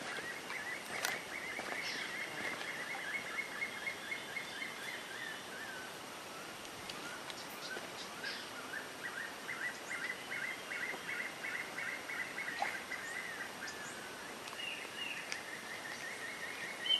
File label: Spotted puffbird